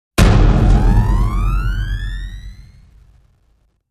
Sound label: Hit